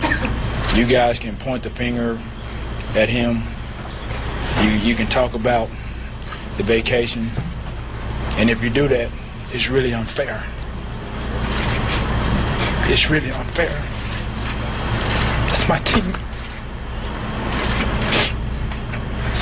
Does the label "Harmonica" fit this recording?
No